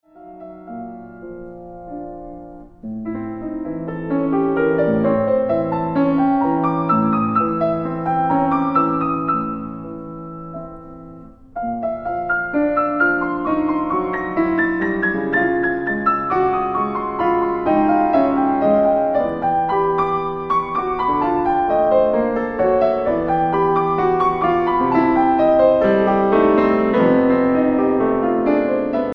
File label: Preludes for piano Allegretto